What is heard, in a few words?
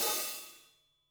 hat closed